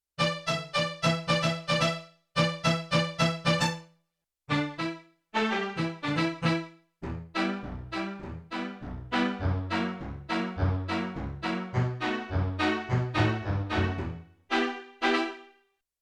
Groovy Strings, Yamaha TX16W; Orchestral Performance 1

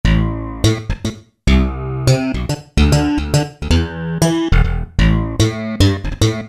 Slap It; Roland PN-D50-02

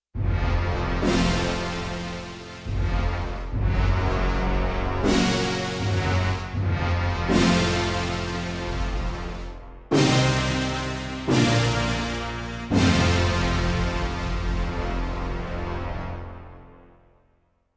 Orchestral, Yamaha TX16W; Orchestral Performance 1

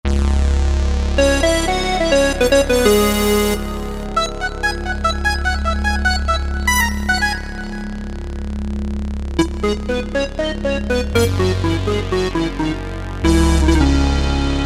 On Alert; Generalmusic S2R

